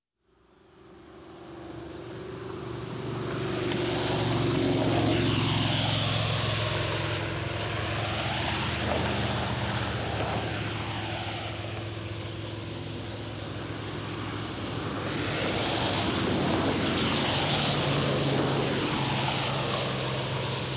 Grossstadtgeraeusche Autos Und Lkws Auf Der Autobahn

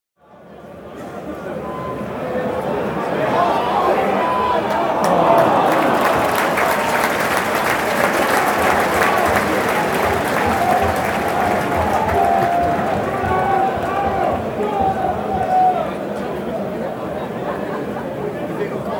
Stadion Fans und Gesaenge

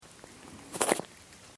Katzenfutter

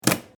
S Bahn Fensterklappe

